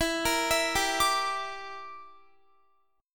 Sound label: E Minor 7th Flat 5th E, Guitar; Em7b5; arpeggiated